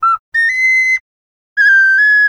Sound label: Ocarina; legend of zelda the links awakening 2019 switch; Hetakuso